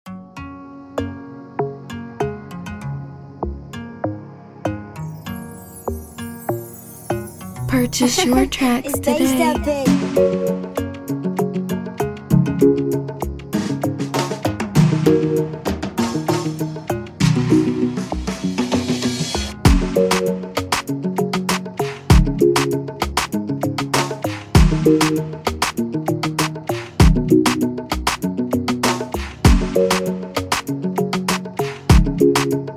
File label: Afrobeat Instrumental